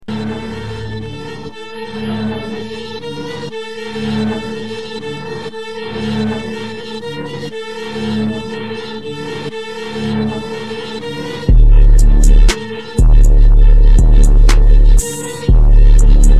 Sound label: no vocals